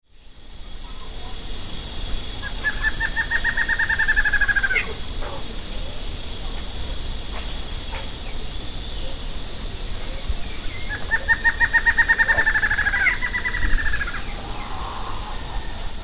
Thamnophilus palliatus Choca listrada

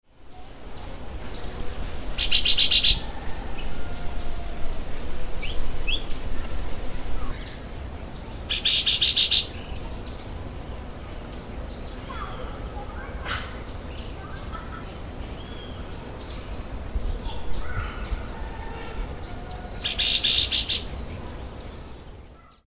Euphonia pectorialis Ferro velho

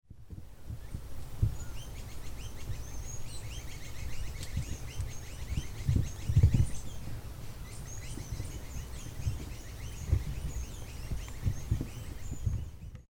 Phaethornis squalidus Rabo branco pequeno

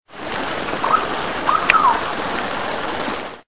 Carpornis cuculata Corocoxó

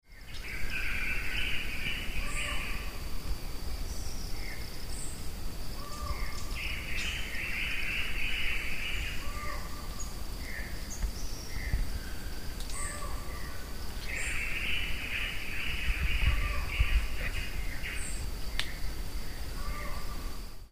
Micrastur semitorquatus Falcão relógio

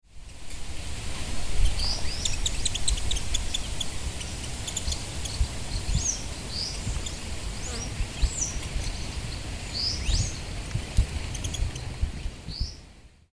Serpophaga subcristata Alegrinho

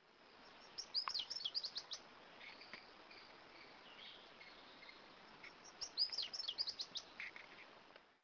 Phaetornis idaliae Rabo branco mirim

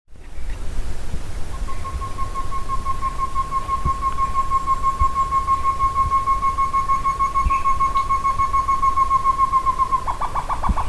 Chamaeza campanisona Tovaca campainha

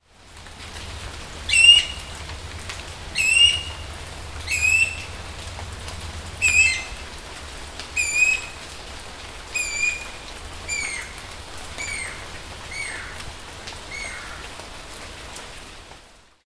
Guira guira Anu branco

